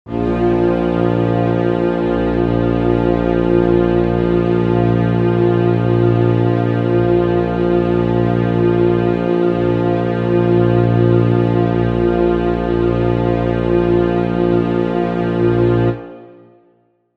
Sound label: bb low tuning drone